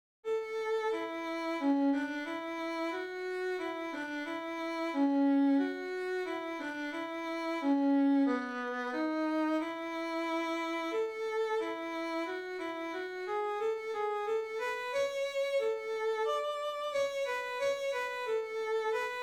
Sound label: Melodic Dictation